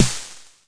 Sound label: Snare, Jomox Xbase09